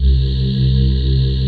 PAN CHOIR; Pro-Rec - Synthsonic; TRINITY

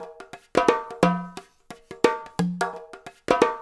loop, percussion; InVision Interactive; Percussion Slam; KWICKIE

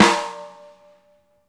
BLACK TAMA, KITS; Sampleheads; New York City Drumworks VOL-1

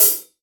ROCK KIT, Real Drum Kit

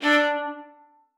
MART D4, VIOLA SPICC; Xsample VOL-5; 90 s Sample CDs

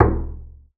BASS FINGER; Xsample VOL-5

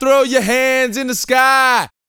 VOCAL F; ILIO

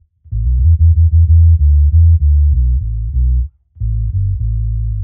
Bass 95 E, dub, 95bpm; musicradar; dub designer

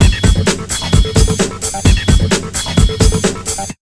HARD 130, Big Beat Drumloops

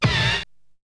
Hit; ZERO G - Datafile DISC 1

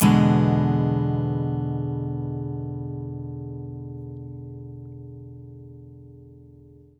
BARI CHORDS, Blues Guitar; ILIO; Fretworks

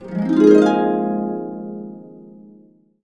AB, Harps; USB Soundscan vol.62; AKAI

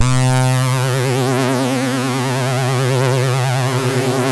Synth and Organ; InVision Interactive; Keith Emerson

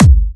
Trance Kicks, Bassdrums; Vengeance; Essential Clubsounds Vol.4

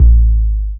bass sub; Ueberschall; Techno Trance Essentials

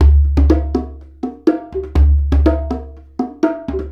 Combo, Essential Percussion

